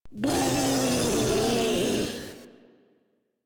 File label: Vocal Attack; Warframe; Proto Infested Ancient